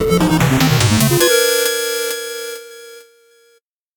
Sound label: 8bit jingle slow